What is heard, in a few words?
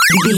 retro collect pickup item